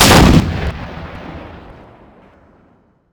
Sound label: M72 LAW, Weapons Heavy